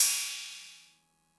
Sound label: cymbal; MFB-522